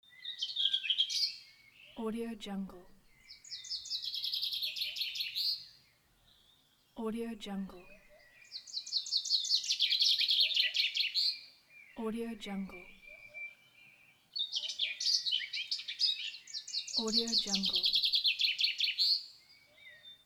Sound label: birds